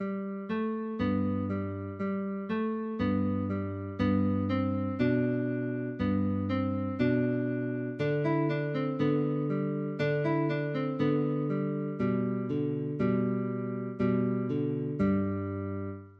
frere jacques, guitar

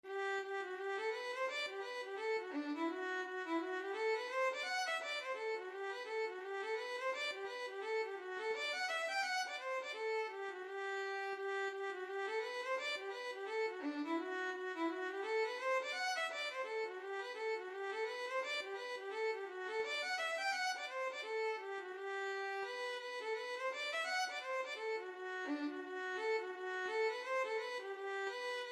hornpipe, violin

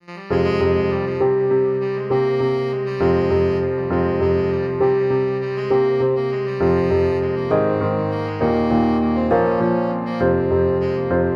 pirates, tenor saxophone

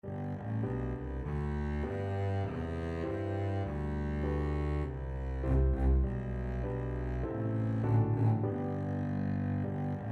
teapot double bass

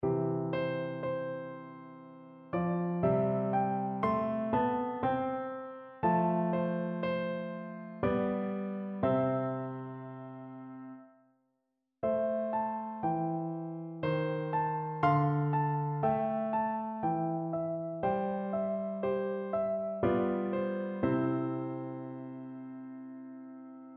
aka tombo PNO, piano

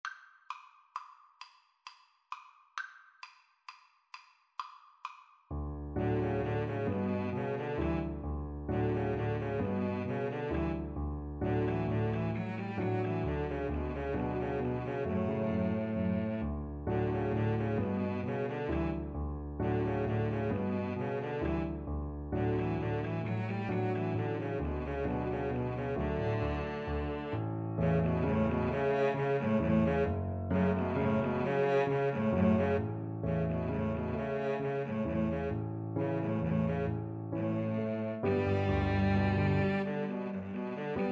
lakme, Viola Cello Duet

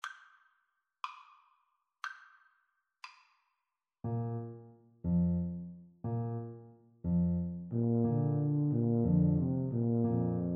Tuba Duet

